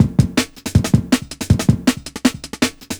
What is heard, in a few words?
Breakstyle, Drum Loops, 160; M8; Electronisounds